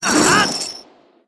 Ball And Chain Throw

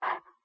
Wolf Huff Sit; Wolf Link

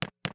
Kick; Midna

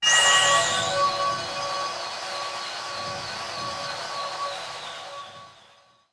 Gale Boomerang Ready

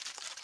Golden Bug

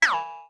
Aim Exit

